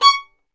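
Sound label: STR violin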